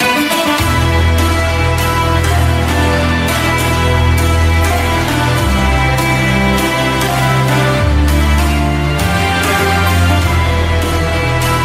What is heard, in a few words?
Bgm instrumental; KGF 2